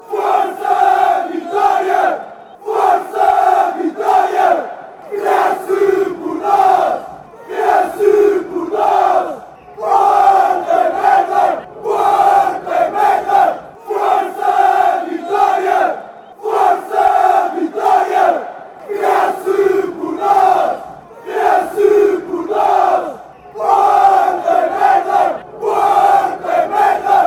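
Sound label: go vitoria shout, football chant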